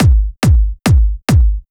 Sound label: Kick 4/4 140BPM; Drums & Perc; Vengeance Samples; Selection Kit; E minor